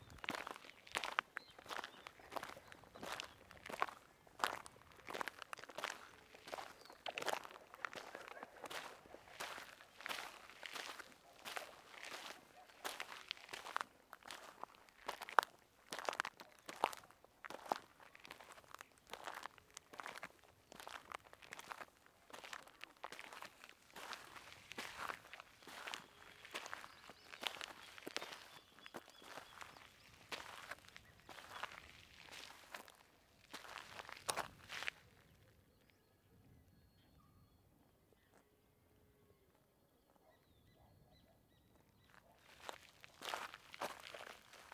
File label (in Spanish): PASOS sobre BALASTRO